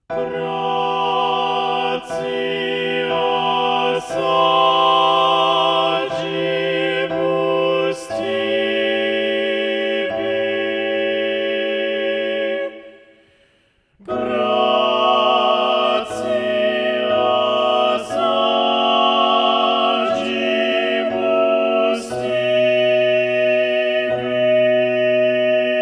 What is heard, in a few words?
Balanced Voices; Gratias agimus tibi and Propter; Gloria; Vivaldi